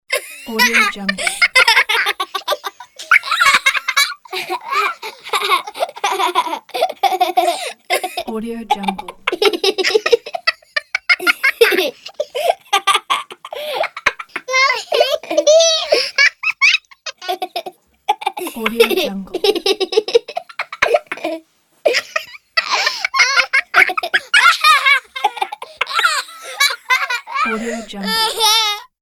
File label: baby kids laughing